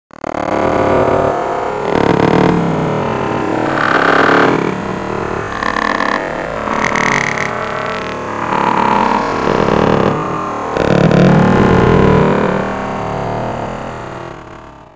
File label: canis slow; Hellboy; CD Expert nº 41